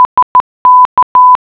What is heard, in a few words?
morse code